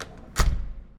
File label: door close; Golden Crayon Sounds